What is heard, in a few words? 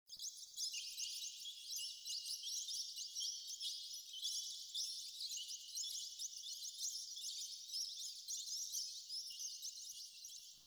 Island Cave Bats; Dungeon; Ambience; Duviri